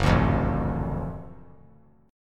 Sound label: Gb Minor Major 7th Sharp 5th Gb down; GbmM7#5 strummed; JGuitar